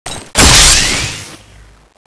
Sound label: jet gun reload, weapons